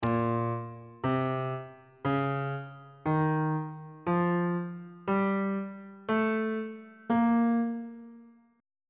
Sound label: a sharp melodic minor scale on bass clef